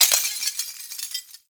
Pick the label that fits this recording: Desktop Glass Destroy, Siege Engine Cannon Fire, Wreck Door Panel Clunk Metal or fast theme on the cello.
Desktop Glass Destroy